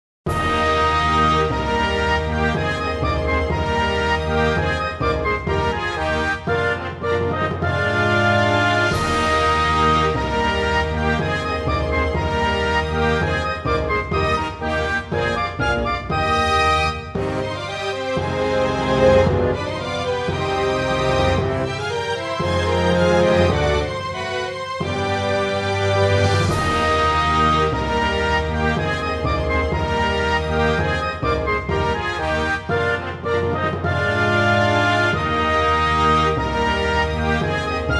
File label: orchestra; 城; maoudamashii